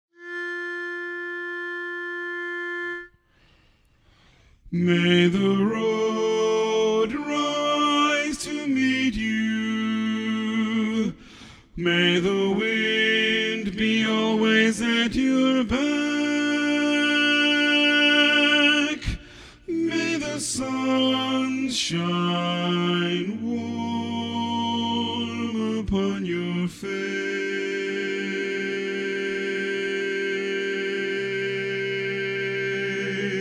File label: Irish Blessing - Lead Loudest